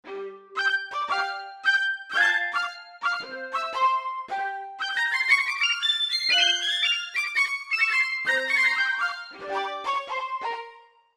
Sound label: Up Part, Korg MSC-1S; Korg MSC-1S Variety